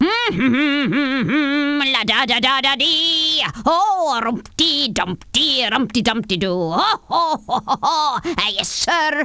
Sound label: Sings